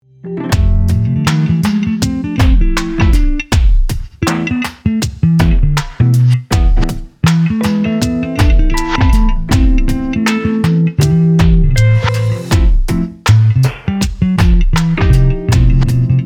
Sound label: bass